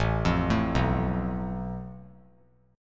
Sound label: A Suspended 2nd Sharp 5th; Asus2#5; arpeggiated; 9,12,12,9; 3C,3F,3A,3D; JGuitar